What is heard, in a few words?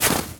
Footstep Snow Run